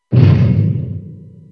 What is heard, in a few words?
step